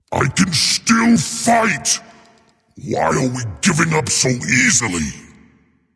death; smite; voicepack; xingtian

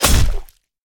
melee impact; smite; god odin

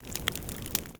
campfire; Smite; Sol

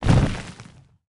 footstep; smite; geb

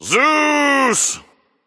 battlecry; smite; zeus

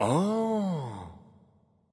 grunt understand ah; bacchus

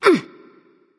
grunt jump; smite; freya

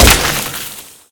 large impact; smite; thanatos

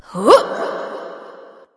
grunt jump light; smite; voicepack; hel

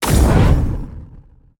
projectile; smite; poseidon

